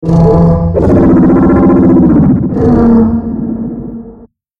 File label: Alien Open